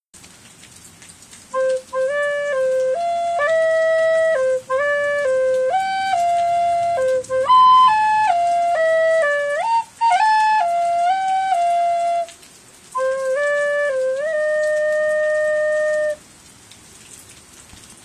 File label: Happy birthday recorder